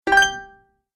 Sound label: Great Idea, cartoon; Cartoon Sound Design Sound Effects